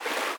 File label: fast water, river sounds